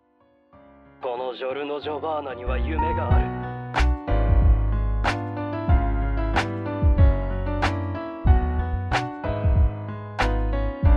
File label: Theme lofi